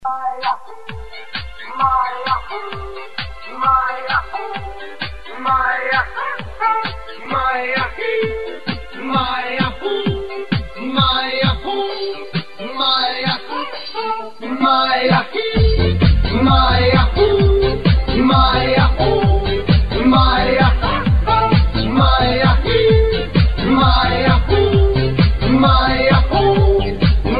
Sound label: romanian cover